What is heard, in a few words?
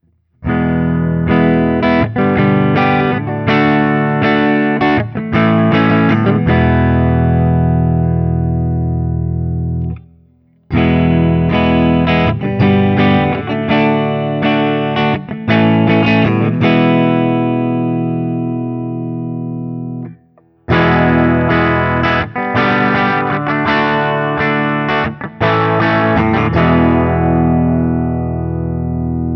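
Guild Bluesbird Holoflake ODS100 Open, guitar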